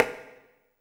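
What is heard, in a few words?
ambience industrial, ethnic percussion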